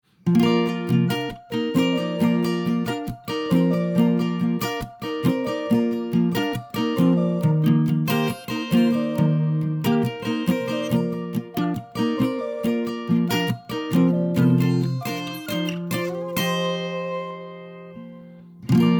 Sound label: country happy